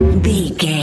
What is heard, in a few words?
sci-fi code fail